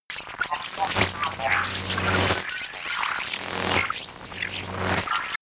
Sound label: promo pad